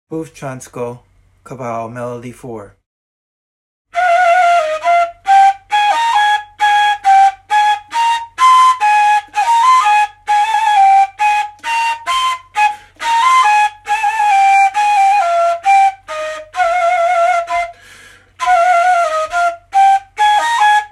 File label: kaval; Bufcansko